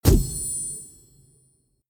poof Sound; LetterMagnets

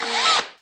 panel Movement; CursiveLetters

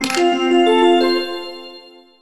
chest Sound; MQ2

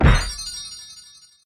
hit Sound; BattleBuddies

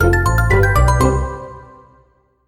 level Complete Sound; EggHeads

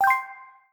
grab Sound; PatternBlocks

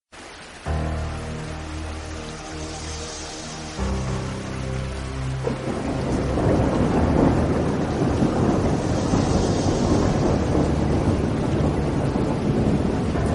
Storm Music; MainIdea

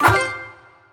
weapon Sound; MQ2

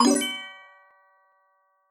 ring; MQ2

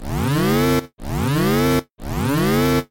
sci Fi; ClassroomTimer

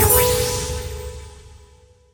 flash Sound; RandomNumberPicker

